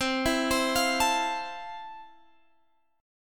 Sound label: F Major 7th C